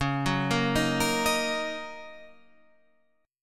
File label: Eb Minor Db; Ebm/Db arpeggiated; JGuitar